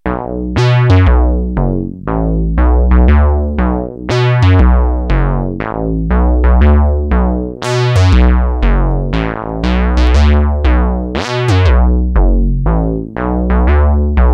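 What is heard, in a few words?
bass, Moog Minitaur Bass Synthesizer; Bass: money!; analog monophonic synthesizer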